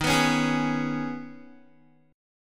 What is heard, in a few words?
Eb 7th Suspended 4th Sharp 5th, Guitar; Eb7sus4#5; strummed